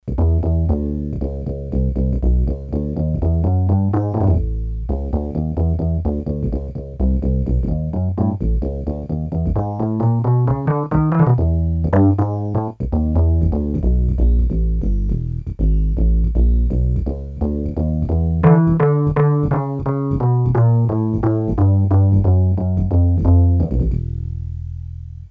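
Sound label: Acoustic Bass, Korg DS-8; Factory Patches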